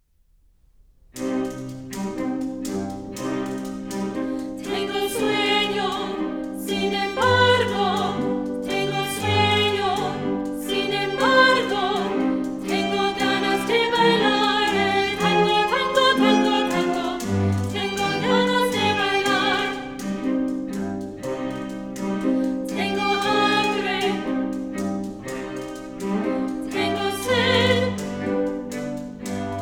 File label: tango spanish